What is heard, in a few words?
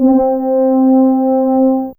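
Trombone Mid C3, Fairlight CMI IIe; Brass1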